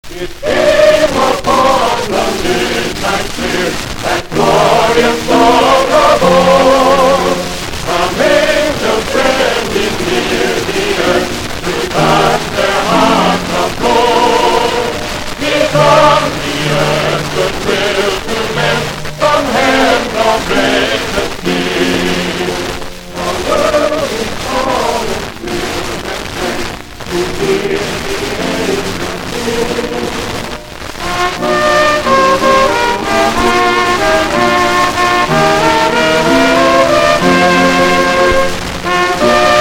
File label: Chorus; It Came Upon A Midnight Clear